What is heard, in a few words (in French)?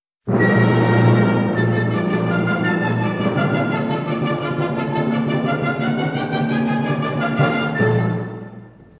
intro chaos sonore; dissonant, tumultueux qui exclut toute possibilité d'intervention de voix humaines; Beethoven